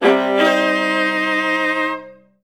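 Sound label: violas C3; Best Service; Peter Siedlaczek's Advanced Orchestra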